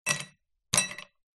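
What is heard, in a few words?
Ice Cube Throw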